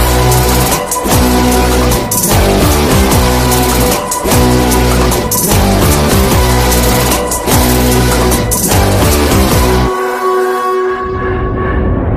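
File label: background music Ringtone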